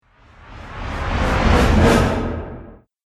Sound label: Flashback Transition Whoosh; Twistedtracks